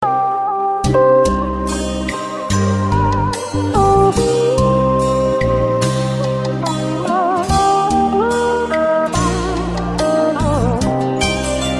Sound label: Vietnamese Dan Bau